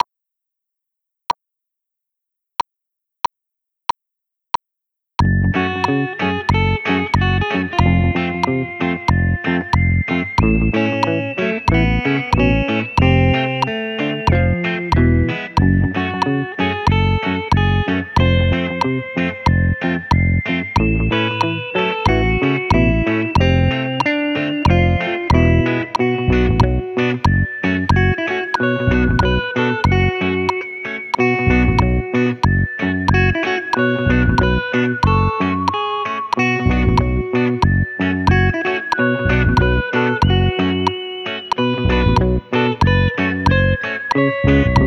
Guitar

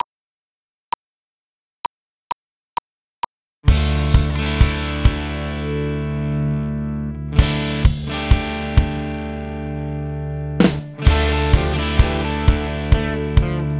Post Disco GTR Drums

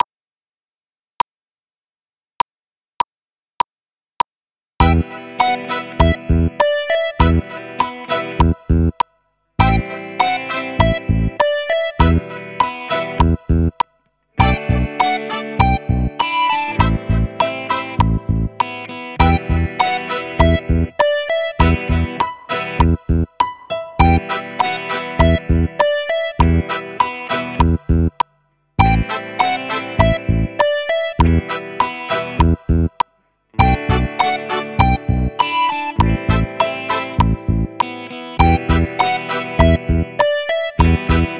Funky Keyboard

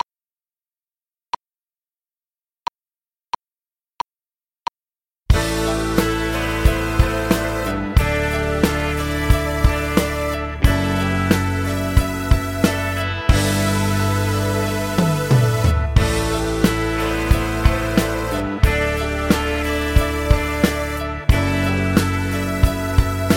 KB Drums

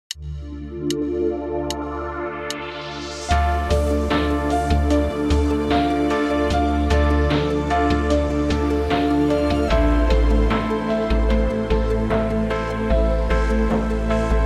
Dance

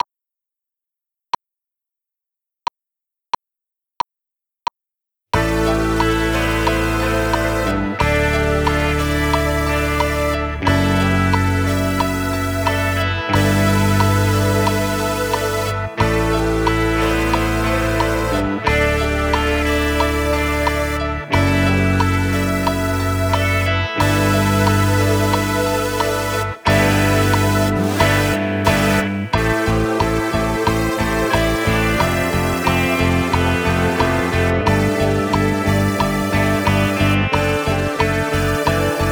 Keys

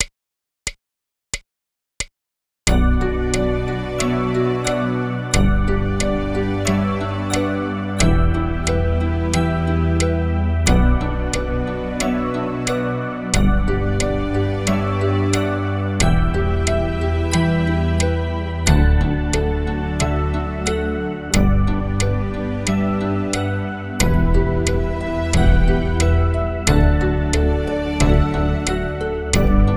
Ballad

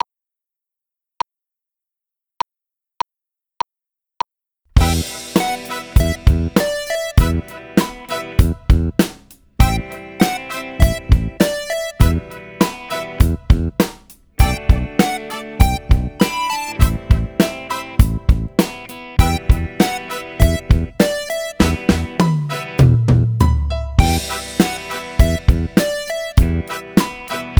Funky Blue Keys Version

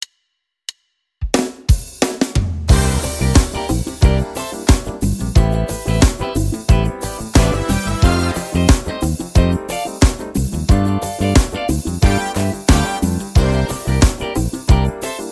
Chord Exercise; Chord Exercise 1B

